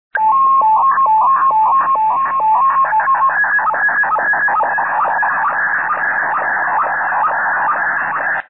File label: sstv